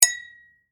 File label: Household, Champagne, Glass, Clink, Toast, Cheers